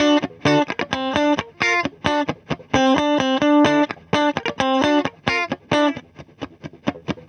GTR, Roots Disco; USB Soundscan vol.32; AKAI

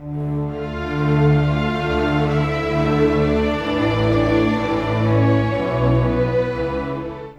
Strings; Sonic Foundry (Sony Creative Software) - Orchestral VOL-2; Modern 38

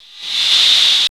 SYN FX; X-Static Goldmine 1

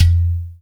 UDU; Spectrasonics; Heart of Africa

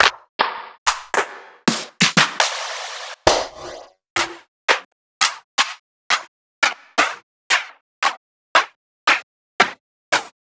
Claps

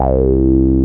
A BASS; CMP - Analogon

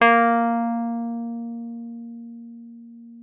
WURLITZER, A#3; Xsample VOL-1; 90 s Sample CDs; WURLITZER RS

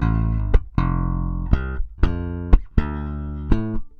SA BS AM, BASS SALSA

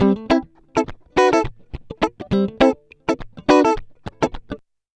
104 FUNKY, Electric & Acoustic Guitar Loops; USB Soundscan vol.04; AKAI

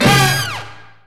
Brass Hit; Proteus 2000; Digital Sound Factory Proteus 2000

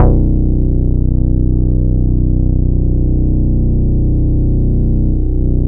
MONO BASS, Bass Analog & Synth, DISCO; USB Soundscan vol.12; AKAI

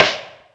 sd side, Yamaha TG-500

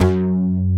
NOIZE BASS; Dance; BASS SOUNDS; Masterbits; Dance Trax

